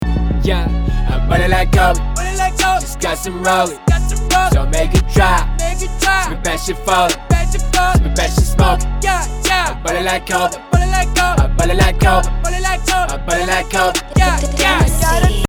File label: rap